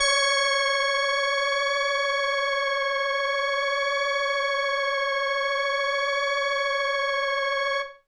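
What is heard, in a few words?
Indie Organ Kawaii C#1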